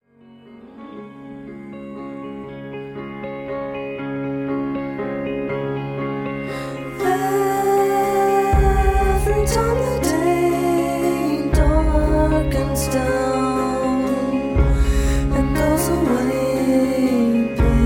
Waltz

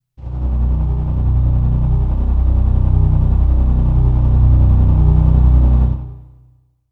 sound of fear; FILMSCORE EXERCISES

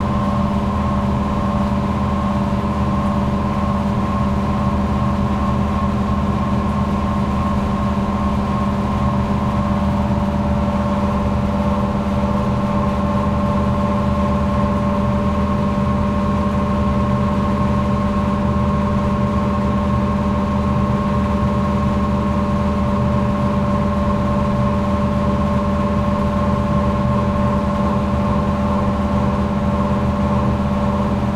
air conditioner unit exterior; Hums Light Machines; RedSonic

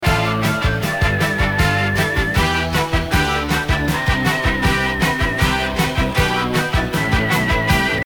Horns

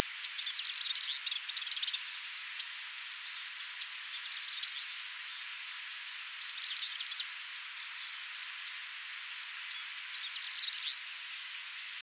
Vireo bellii Bell's Vireo

